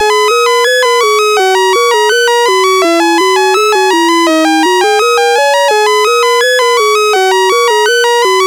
Shuffle BASIC sid CSG8580R5

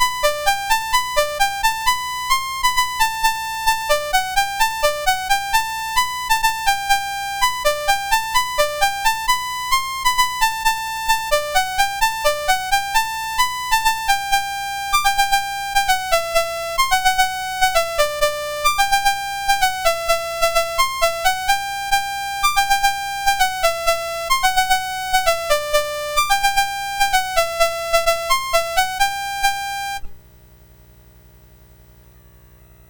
Piano, MOS6581R4

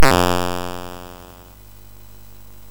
sid MOS6581R4, GAMES; Chinese Juggler

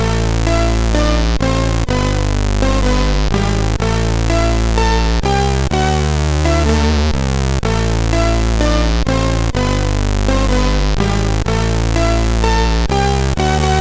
sid CSG8580; HVSC; Belissima

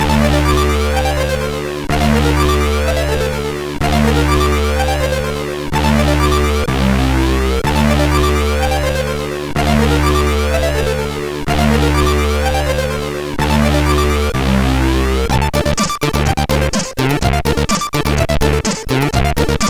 New Idea, CSG8580R5

